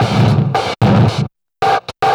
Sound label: PHUZZ PHUNK, PHUZZ BEATS; Spectrasonic Distorted Reality 1